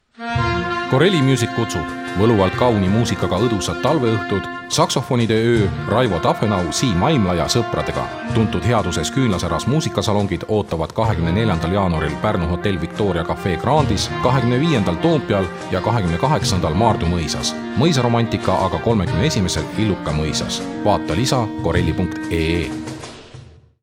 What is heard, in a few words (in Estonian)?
saksofonid; corelli